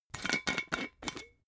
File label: glass bottle massive open